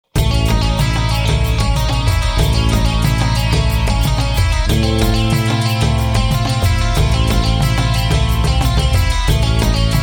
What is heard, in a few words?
African Rhythm